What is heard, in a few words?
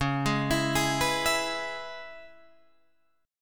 C sharp Minor 7th, Guitar Open D